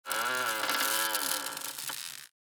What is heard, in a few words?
Hamper lid open creak; basket